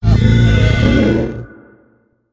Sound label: spider hail